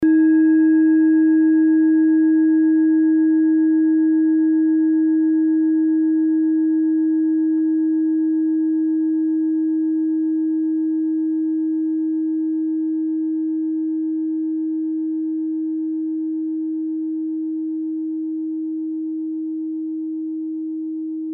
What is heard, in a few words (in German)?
klangschale tibet